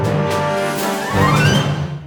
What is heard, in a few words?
Pirate Party Time Reload Stinger